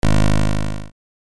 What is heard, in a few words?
error; PPdastr Studio